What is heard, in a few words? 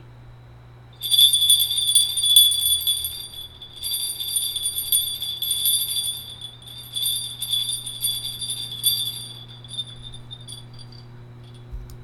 bear